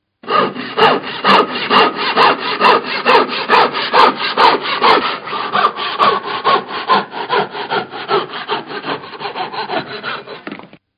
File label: SAW; Machines; Partners In Rhyme